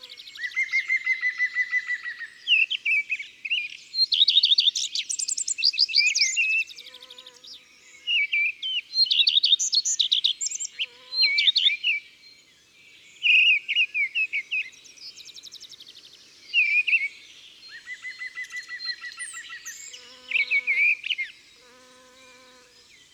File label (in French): Pic vert, Chants d oiseaux dans la campagne